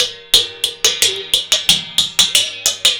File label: WATER, Indian Traditions; USB Soundscan vol.45; AKAI